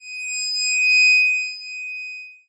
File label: 8bit kit ring; LO FI